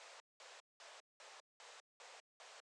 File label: amp pulsed pink noise